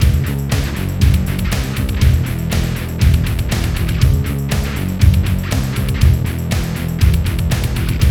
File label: FAT ROCK; Best Service Advanced Media Trax Building Blocks; FAT ROCKA